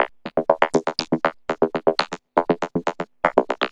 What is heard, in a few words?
Vocobeat; Sonic Foundry (Sony Creative Software)